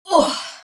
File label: human, creature